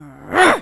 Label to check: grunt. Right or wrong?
Right